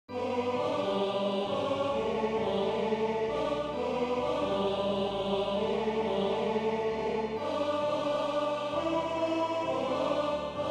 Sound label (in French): Alleluia des montees a 4 voix avec schema melodique invocation a 2 vo; Messe de Verrieres